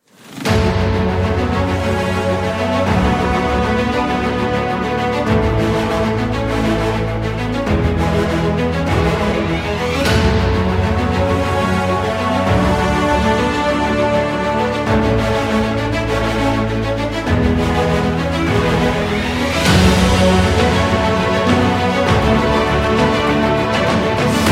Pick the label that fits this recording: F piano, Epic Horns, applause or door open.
Epic Horns